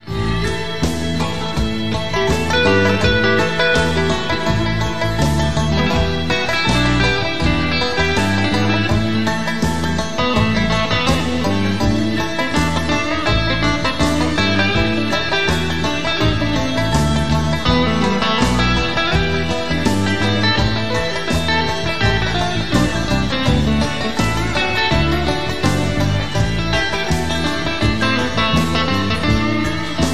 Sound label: Schecter tele; rouge blanche